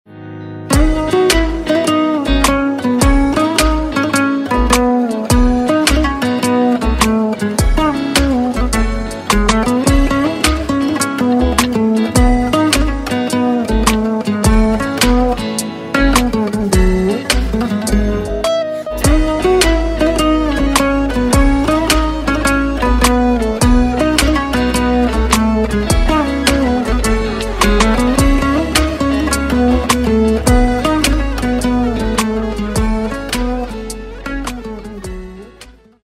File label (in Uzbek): gitara gudok uchun